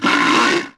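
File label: Bear Attack Vox; Civ4 warlords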